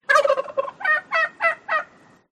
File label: Turkey